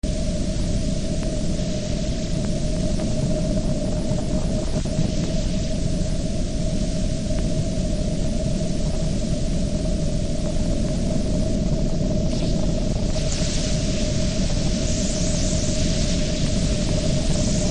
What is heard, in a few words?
PVC Leak